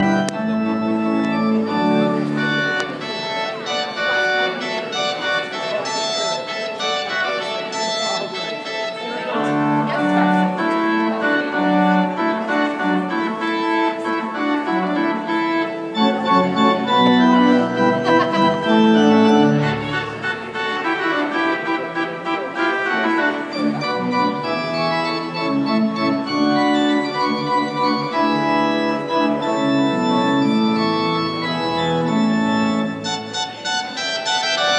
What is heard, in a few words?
Hornpipe; Johnson